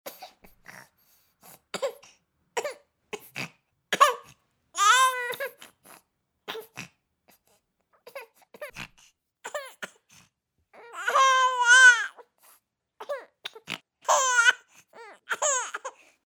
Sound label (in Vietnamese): tieng tre con bat dau khoc kho; buon